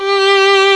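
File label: SOLO STRING G1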